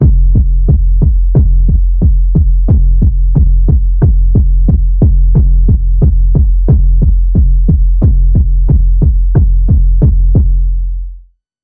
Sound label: Underwater Pulse Wave F